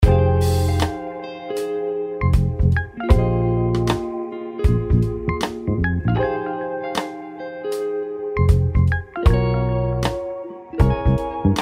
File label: Chill Hop Moods